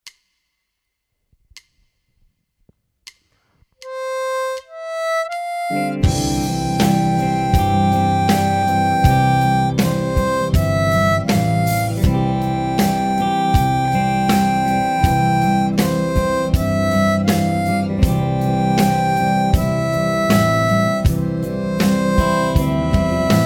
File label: C 80 harp